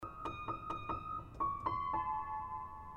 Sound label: Piano